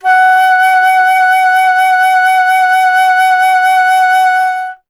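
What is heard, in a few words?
flute F#; Miroslav Vitous - Solo Instruments VOL-2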